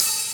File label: Open Hihats, Cymbals; Vengeance Samples